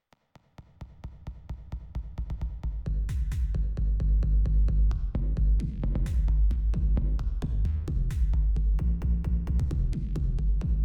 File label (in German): MALEKKO V8 mit VPME QD